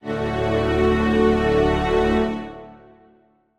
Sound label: Strings start